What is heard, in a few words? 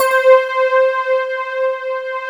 AGOGPAD C4; Big Fish Audio; Synth City